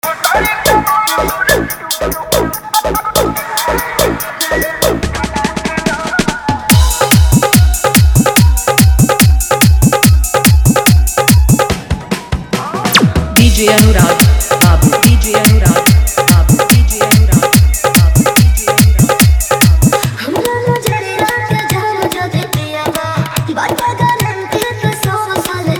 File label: Hard Bass Dhollki Mix